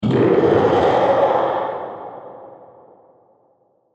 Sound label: Wrath Of The Dragon, Monster Sound Effects; fantasy sound effect; role playing games, magic videogames